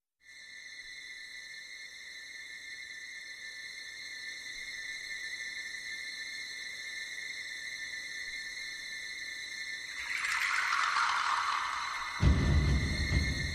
No Oohs

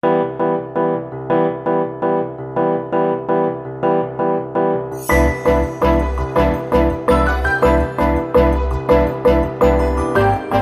No Drum Kit

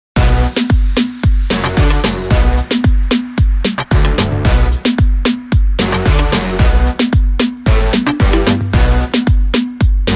Fusion Power Short Rhythm Mix

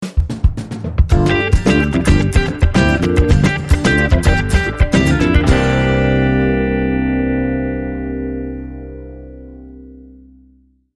Funky Guitar Fast Mambo

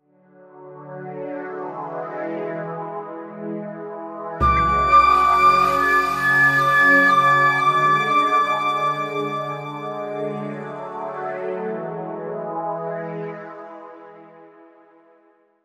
Buddha Trance

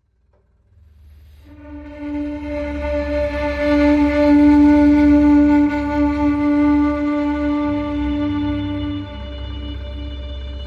High

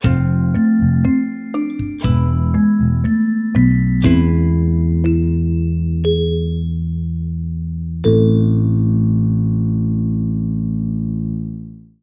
Sting Mysterious

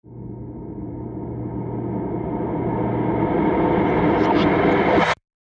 Placid Lake Water Drop Reverse

